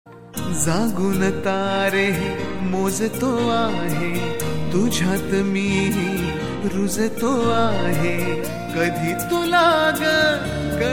male

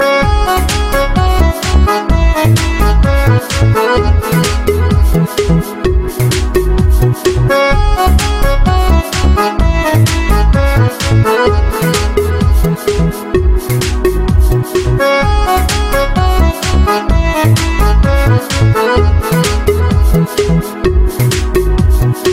Tune

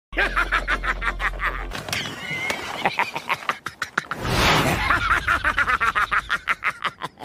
Laughing; Inosuke

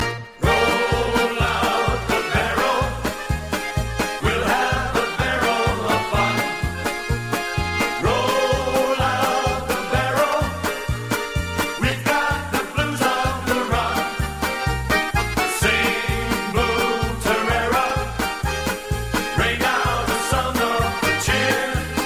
Beer Polka

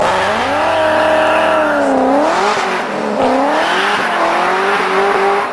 Procharged Z06 Short

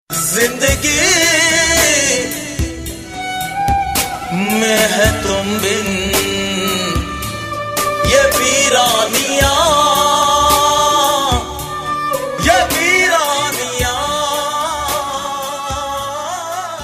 Very Sad Tone

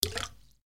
Rain Drop

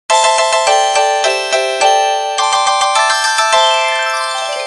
Asian Jingle

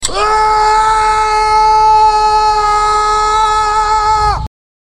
Funny Screach

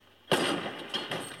Headshot; Fortnite

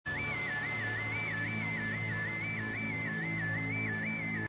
Flute Sms

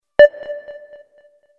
Sonar Ping

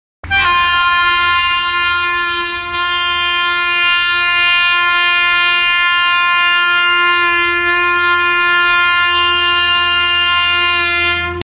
Wap 7 Loco Horn

